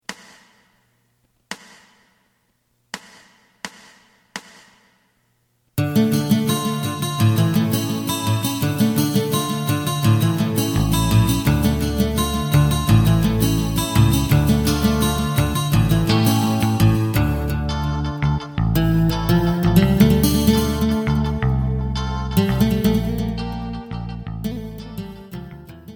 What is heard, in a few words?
drum